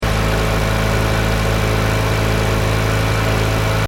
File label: Engine: Medium Revs Loop, car; Vehicles; Car Sound Effects; Cars (Sound Effects:Vehicles); Stuart Duffield